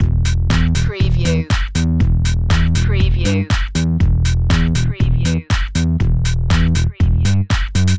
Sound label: lush loop, Catchy and simple dance track, has a slightly disco feel in the chorus